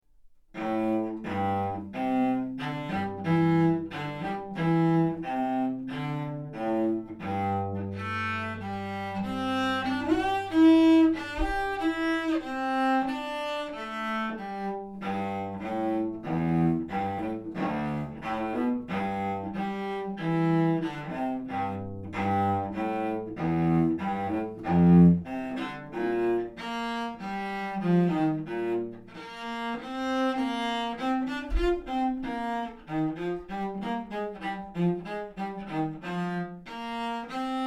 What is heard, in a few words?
Solo Cello; Pictures at an Exhibition; Promenade A; Classical , Romantic Era; Advanced